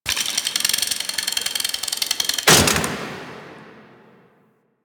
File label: Damage Limb Rattle; Sentient; Warframe; The New War; Teshin Intro